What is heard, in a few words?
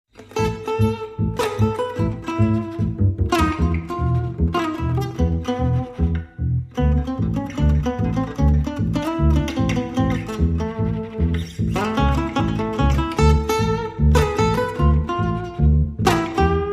Bass and Lead Guitar Only